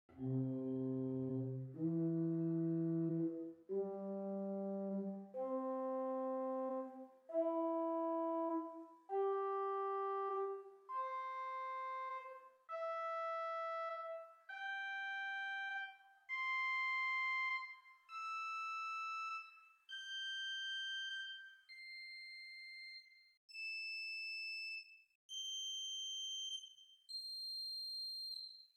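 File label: Swell Salicet 4 arpeggio, organ stops; St Annes Moseley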